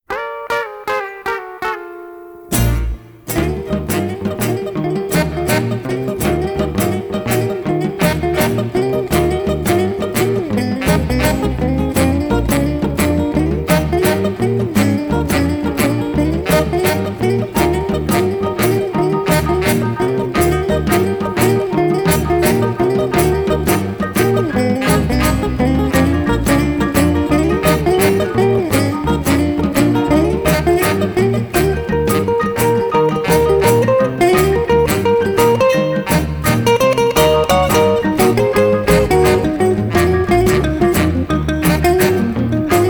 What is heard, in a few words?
Instrumental